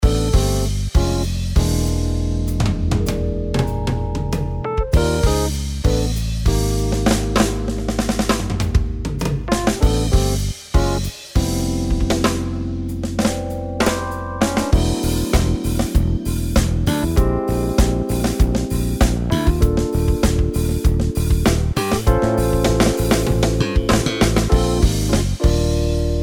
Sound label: cool pop